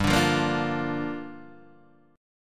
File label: G Minor 7th, Guitar, DADGAD